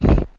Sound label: drag the stone; operation